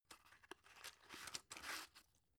drug pull out soft; berufe; arzt

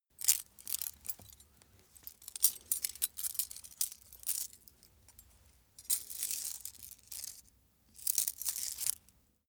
small metal handling jingle long; industrie wirtschaft; metall

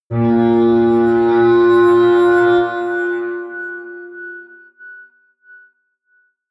atmospheric feedback synthesizer low, drones

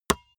basketball dribble tap hard

